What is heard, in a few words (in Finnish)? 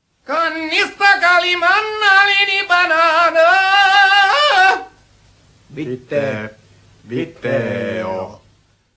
banaanin lastaus laulu